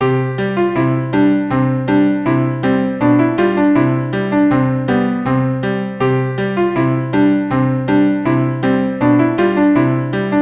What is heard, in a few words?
Deck the Halls easy piano arrangement